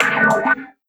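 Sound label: Bello Oneshot, beats; Sonic Foundry (Sony Creative Software); Esoterik Beatz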